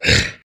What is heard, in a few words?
zombie pig death; mobs; mobs mc